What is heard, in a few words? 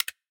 Tactile Click; MenuSFX